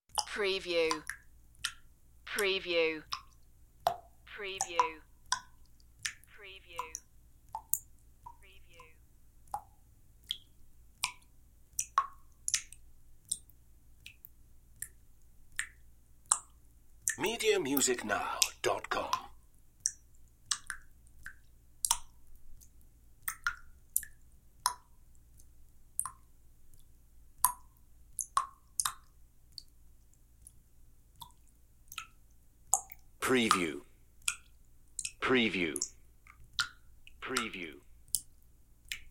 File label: Drips